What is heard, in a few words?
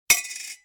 coin drag; weight